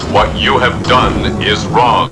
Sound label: death; quake2; robot